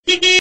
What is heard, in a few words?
mustang horn tone; games; ringtones